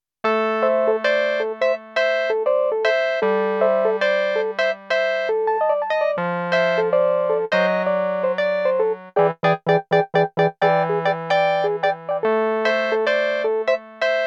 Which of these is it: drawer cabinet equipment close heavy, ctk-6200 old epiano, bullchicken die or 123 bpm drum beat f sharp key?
ctk-6200 old epiano